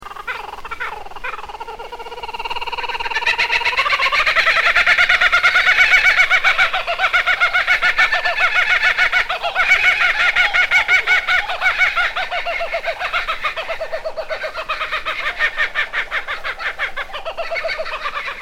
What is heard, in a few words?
Kookaburra; silent flyers bird display